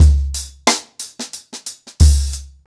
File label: Ripple Effect 90BPM, acoustic drums